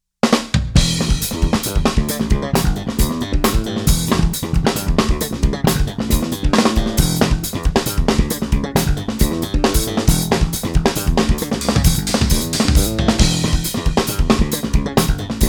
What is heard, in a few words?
Slap N Tap